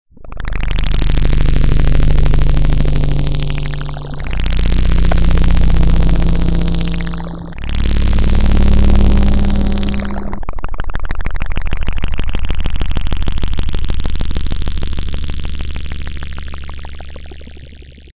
Laser Ringing

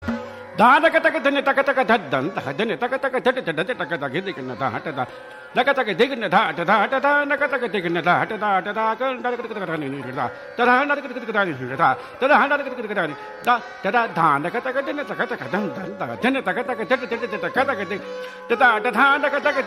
Indian Classical

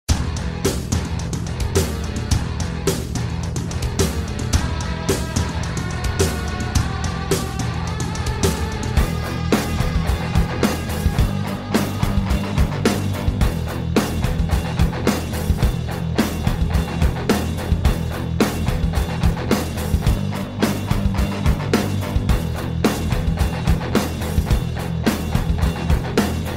Military Rock

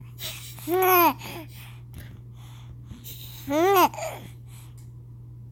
Giggly

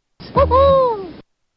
Woohoo

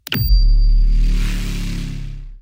ding; Doom

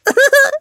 Giggle

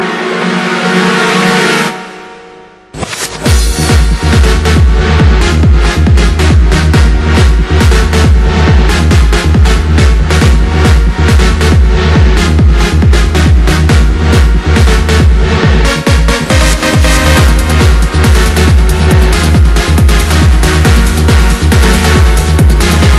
Trance Energy Mix

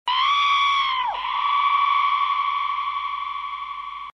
Aaahhhh

